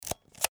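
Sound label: camera click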